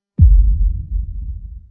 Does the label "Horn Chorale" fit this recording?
No